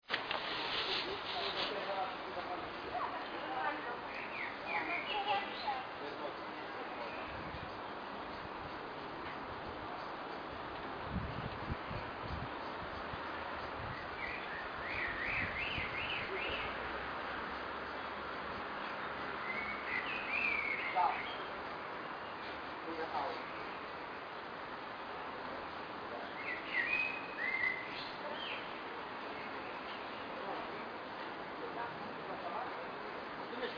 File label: backyard, birdsong